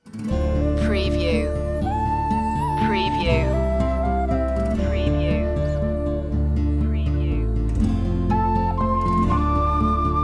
scarborough fair low flute version

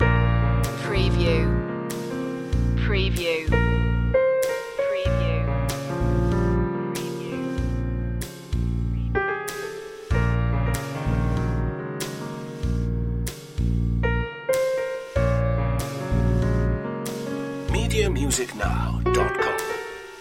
piano slow swing loop

